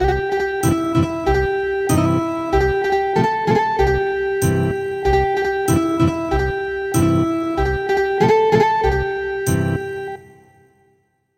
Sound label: Ethnic Guitar